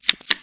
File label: deal two cards; PokerTH